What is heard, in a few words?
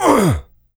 pain light, player; DoomRTX